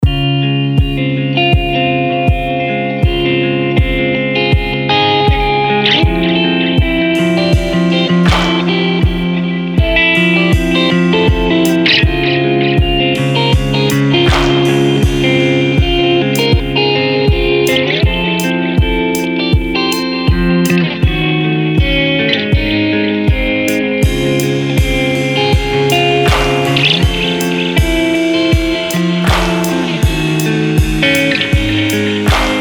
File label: Rain Drift